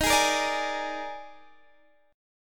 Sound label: Eb Major 7th Suspended 4th, Guitar, Standard; strummed; x,18,18,15,15,16; EbM7sus4